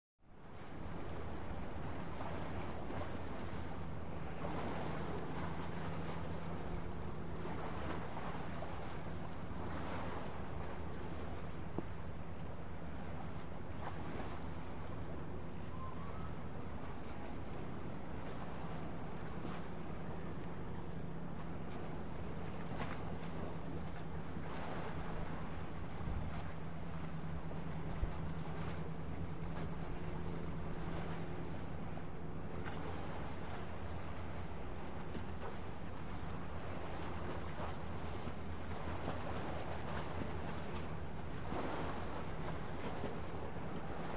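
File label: Harbor Ambience Ferry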